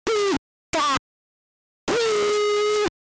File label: Pikachu SFX; RBY